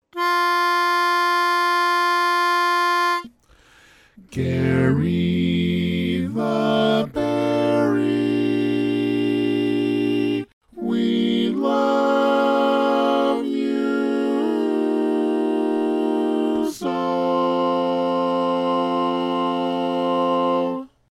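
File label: baritone, barbershop tag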